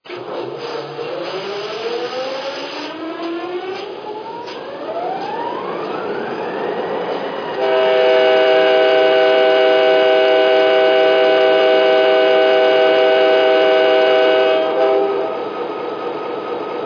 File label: SD35